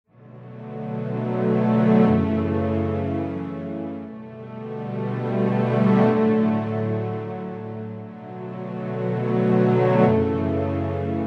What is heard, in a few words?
4 Strings